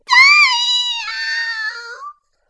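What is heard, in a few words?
pain critical death; Suika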